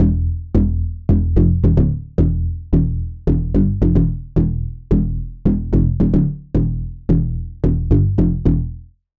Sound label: Bass; Doom Bolt; Routed Sound; Serum 2 Expansion - Doom Carpenter Vol.1